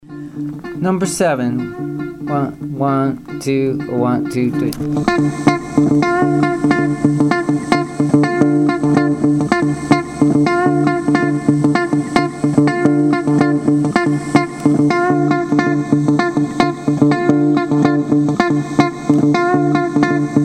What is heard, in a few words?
mozambique patterns